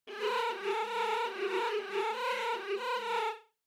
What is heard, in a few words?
low chatter